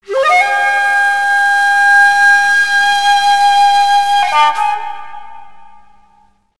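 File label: shakuhachi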